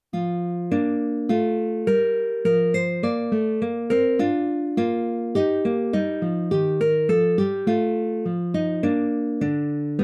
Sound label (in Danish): Et lidet barn, Orgelkoraler; Orgelkoral for manual med pedal/manual alene over Carl C. N. Balles melodi fra 1855; Orgelkoraler til gudstjenestebrug